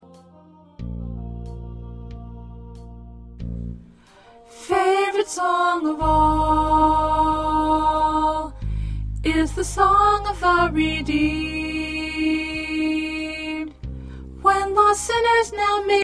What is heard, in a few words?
Alto